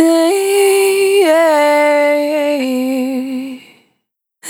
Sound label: Single Voice Melodic Loops, Vocal, Dry; Sony Creative Software; Pocket Diva World Class Vocal Samples; Discovery